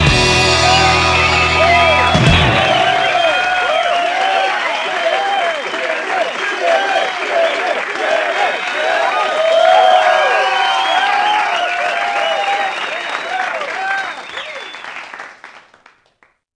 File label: Tourny Success Stinger; bully scholarship edition